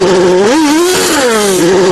Funny Car Rev; ringtones

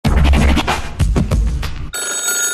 Ring Rap, ringtones; funny

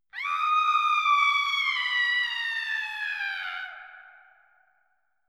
Scary Sounds Woman Falling; ringtones

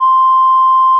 1 kHz Tone at -6db

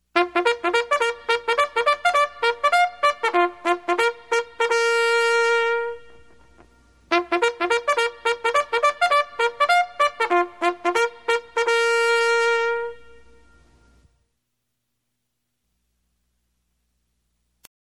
Buggle Call Assembly, ringtones; funny

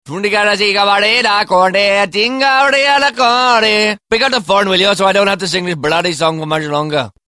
Singing Indian; ringtones; funny

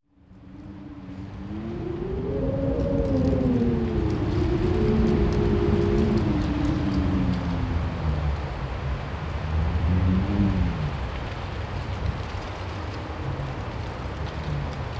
Scary Sounds Wind; ringtones

